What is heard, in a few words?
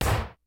HOUSE KIT, DRUMS; Kid Nepro; Elite